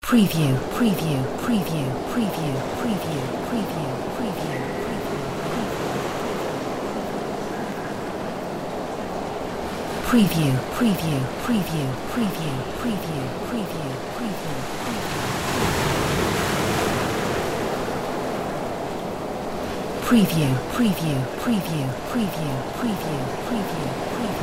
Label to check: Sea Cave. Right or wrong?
Right